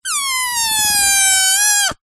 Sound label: balloon air release deflate from valve hiss squeak; foley